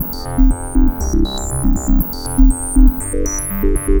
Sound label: Rafter Lead, Synth Textures; Sonic Foundry (Sony Creative Software); The Electronic Music Manuscript Collection